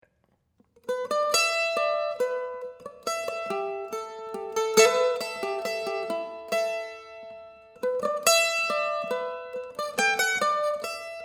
mandolin soft n sad

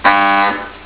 honk

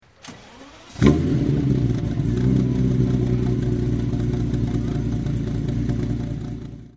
Ferrari F50 Startup

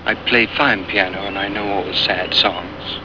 Piano; Outer Limits: Invisibles

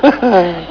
Hahaa

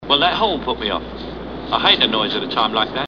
HORN; Well, that horn put me off. I hate a noise at a time like that.

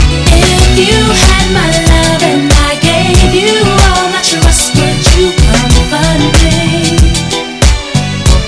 music

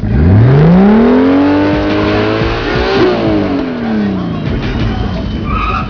FnFcar

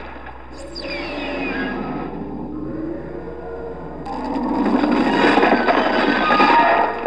roar; Gamera

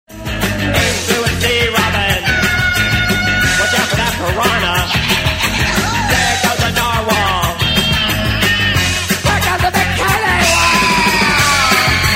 voc scream

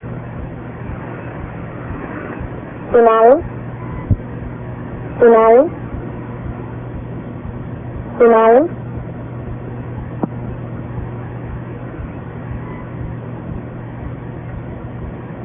Click; V2a end and telephone click

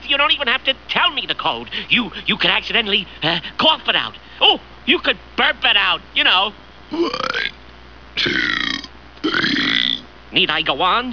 BURP; Teacher's Pet

